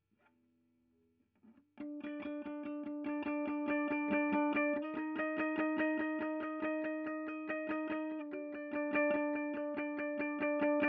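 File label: Guitar Royer; GTR 2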